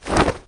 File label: decloak